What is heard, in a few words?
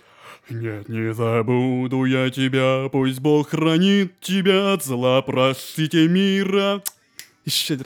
bass